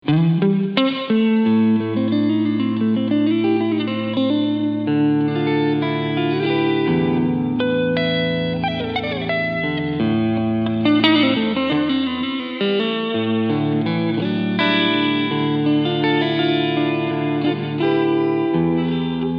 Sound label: Hall Room